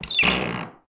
Door squeaking

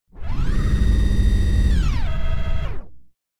Servo burst robotic mechanical movement

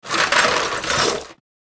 Rummaging through toolbox; hand tool

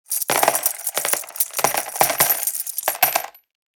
Coin tray cascade slot machine casino

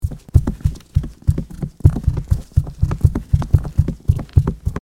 Socks run on wooden surface

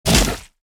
Werewolf claw slash strike

